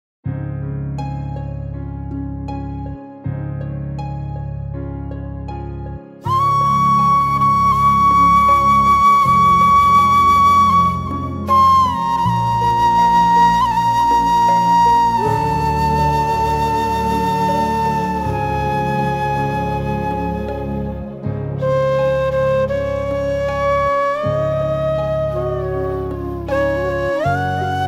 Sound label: Instrumental Flute & Violin